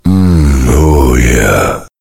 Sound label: sandwich eat; tf; duke